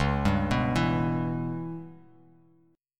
Db Minor 7th Flat 5th Db down Bass Standard; arpeggiated; JGuitar

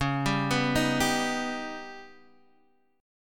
Eb Augmented 7th

